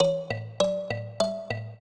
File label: trio, mbira; Mozart